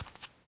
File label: bare step; Golden Crayon Sounds